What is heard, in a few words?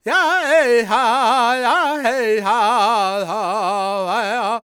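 G# minor, World Voices, Lakota; Spectrasonics Vocal Planet CD5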